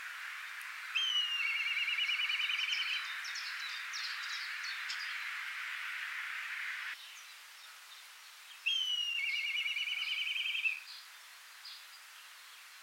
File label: Black Kite, Schwarzmilan